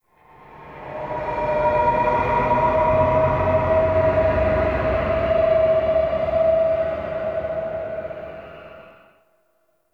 Exterior Eerie, Ambience; Warframe; Duviri

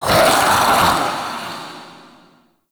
Mockery Male Vocal Attack Heavy; Characters; Enemy; Vocals; Mockeries

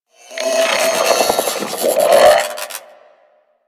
Sentient Brachiolyst Idle; Enemies; Barks

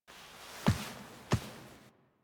Queens Chamber Foley Operator Step From Frame; Warframe; The War Within

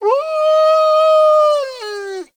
Kubrow Player Lure Call; Venus; Animal Lure; Legendary

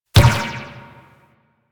Ball Bounce Dirt; Missions; Tenno Ball

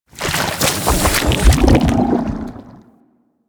Burrow Goo; Warframe; Hunting Critters; Undazoa

